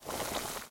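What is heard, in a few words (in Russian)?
неприятный короткий звонкий шум воды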